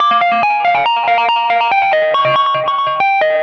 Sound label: Synth, Loops; Trip-Hop